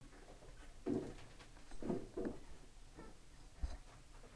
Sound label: beat